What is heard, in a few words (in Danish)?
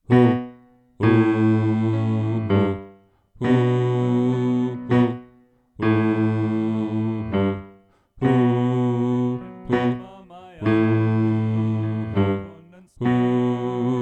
Bas